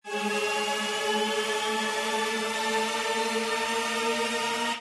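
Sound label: respin Sound; jackhammer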